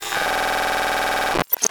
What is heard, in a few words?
Digital Buzzing Rattle Glitch